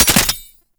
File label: gunbrella mech; Weapons; Warframe; Tenno; Koumei